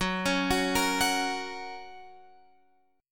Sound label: F sharp Major, Guitar, Open F; arpeggiated; JGuitar